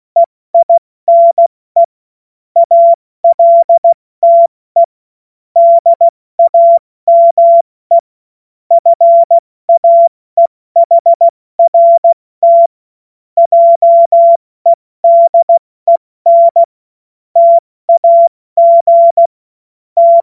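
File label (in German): Witz, cw morsen; Morsekurs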